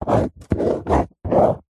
office drawing square pen on paper on cardboard box; zapsplat